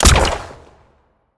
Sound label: Aerobot Shot